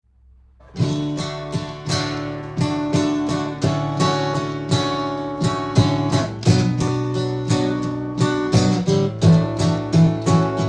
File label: Acoustic Guitar